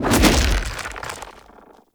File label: arm turret throw; Warframe; Man In The Wall